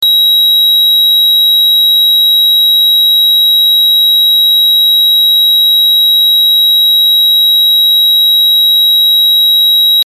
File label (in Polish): ton